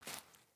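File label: Boot Walk Vegetation, Footsteps; Characters; Enemy; Boots; Sinecure; Melee Grunt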